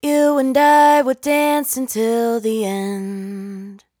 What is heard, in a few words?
hook, 88, Gm, Vocal, Dry Vocals; Raw Vocals; Slate Digital Omnivox Vocal Sample Pack